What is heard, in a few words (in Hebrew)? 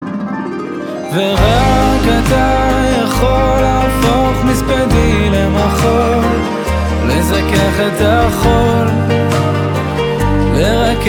צילצול